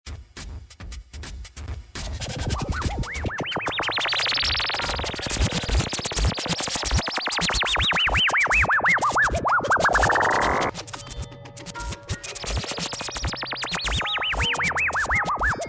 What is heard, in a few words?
Electrobirds